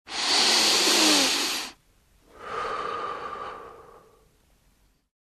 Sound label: Deep Nose Blowing and exhale